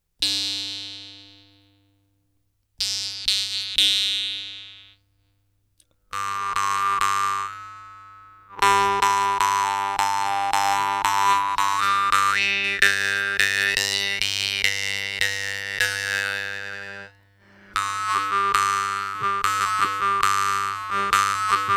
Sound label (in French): guimbardes; leader 75 g3